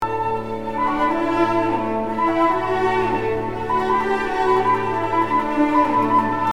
Cantabile Cello Theme; 1st movement; symphony1

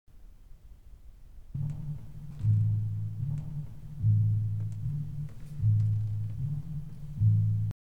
Timpani Ostinato; symphony1

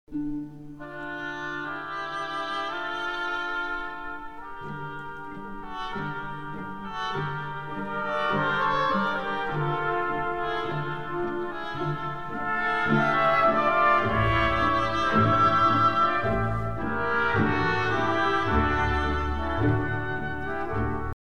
Oboe Melody In Swaying 3rd and 6ths; symphony1

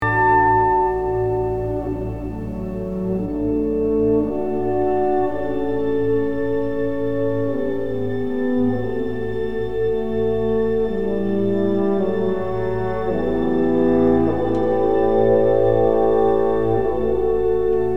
Mellow Horn Mellody; 1st movement; symphony1

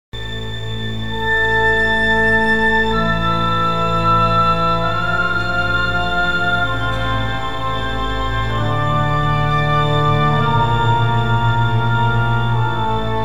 Nature Theme Bars 18 21; 1st movement; symphony1

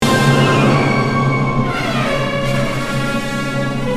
Inferno Triplets Motif; 4th movement; symphony1

